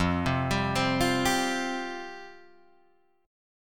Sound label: F Suspended 2nd F down Guitar Open G